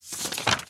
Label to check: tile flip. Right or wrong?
Right